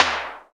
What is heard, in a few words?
SPD-8, DIGITAL